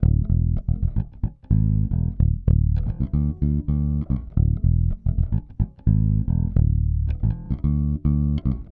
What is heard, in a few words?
Electric Bass; Basses